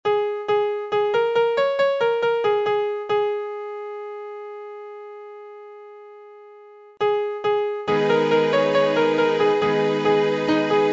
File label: Piano